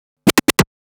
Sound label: Scratch Fills 140BPM; Scratches; Vengeance - Essential Clubsounds Vol.4